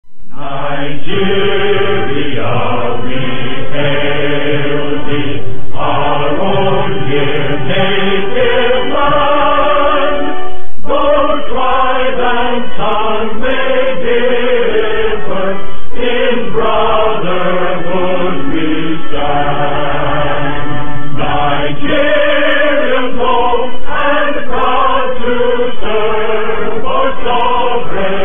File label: Old National Anthem Nigeria; We Hail Thee